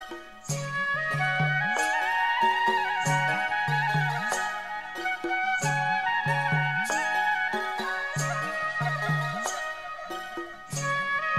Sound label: Flute Ringtone